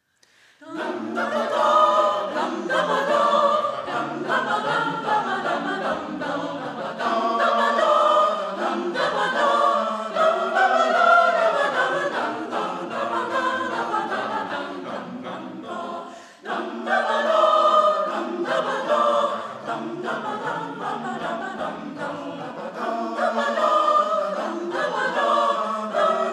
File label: Gavotte; vox mundi 2019 concerts